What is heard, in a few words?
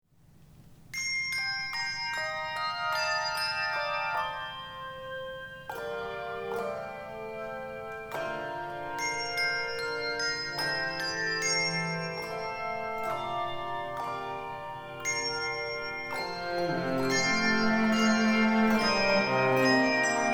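Handbells; Chorister's Guild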